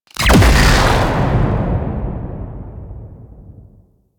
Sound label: Drone Orbital Strike Explosion; Warframe; Corpus; Corpus Lich